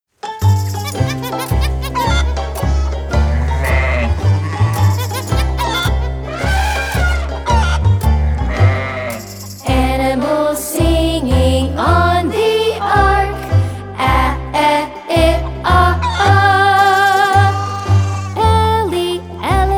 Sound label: Animals Singing